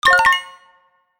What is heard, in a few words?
dice win